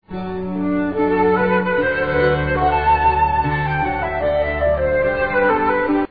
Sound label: flute